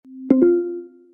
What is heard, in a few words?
siri action completed; Системные звуки и уведомления iOS 18 (iPhone 16, 16pro, iPad)